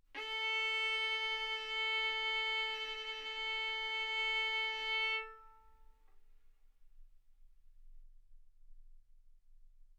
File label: Violin arco A4 B4; mf; sul A; stereo